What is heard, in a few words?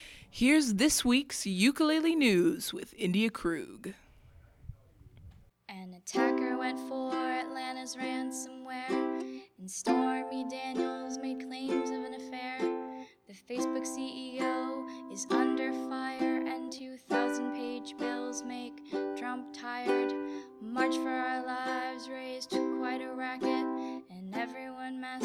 uke